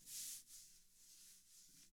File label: TAMA Drums; ILIO; Double Platinum Drums 1